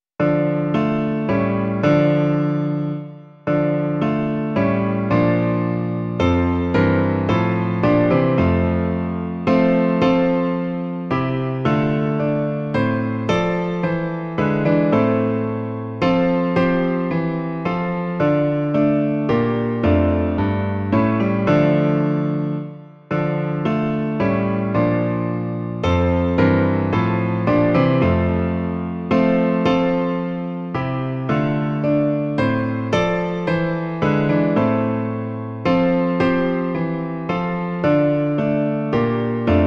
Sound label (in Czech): klavír; Klavírní verze